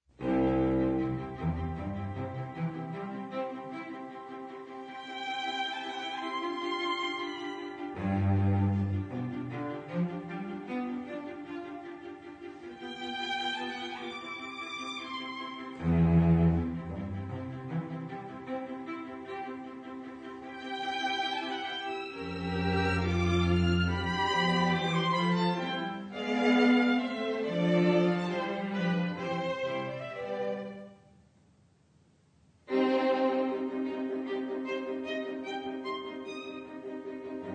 Viola Quintet in C Allegro

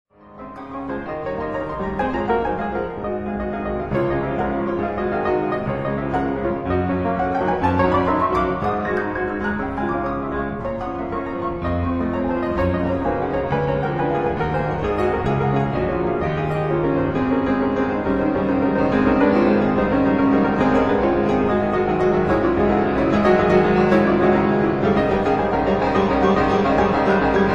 Etudes for piano in Db major

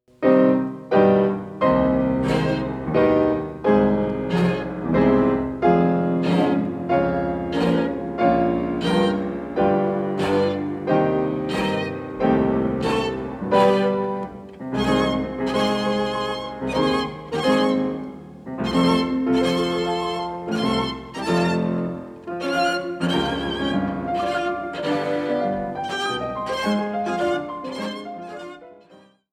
Trio No. 5 in E-flat Op. 70 No. 2 II. Allegretto; Beethoven

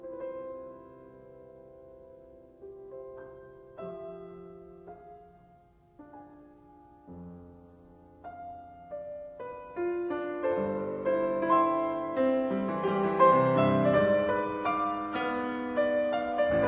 Trio; rogers; Mirecourt Trio

